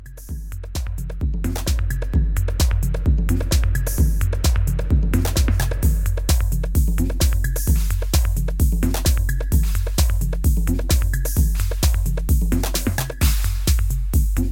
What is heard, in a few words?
Disco Shuffle